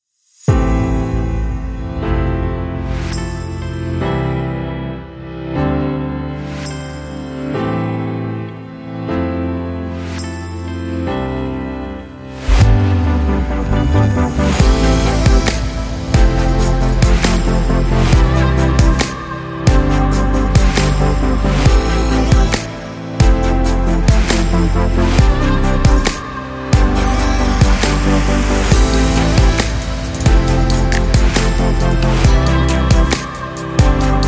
Beauty Atmosphere Beat; ambient beat

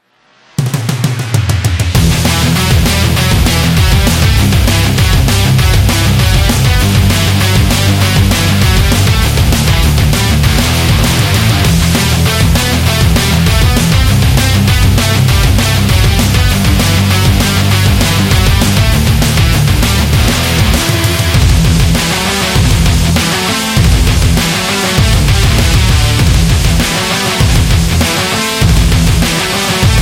Heavy Metal Instrumental

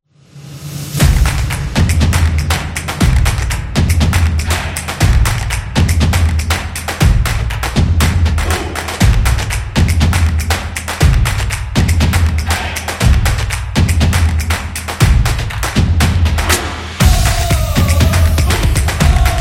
Sport Competition

